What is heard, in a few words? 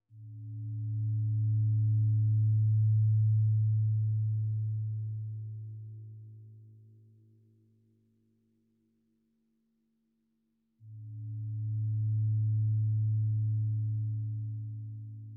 Gong Soft; Raven